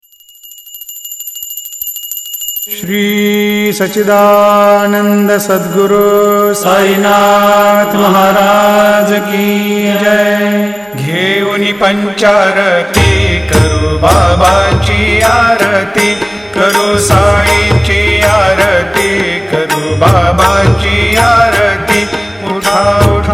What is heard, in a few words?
Sai Aarti Marathi Ringtone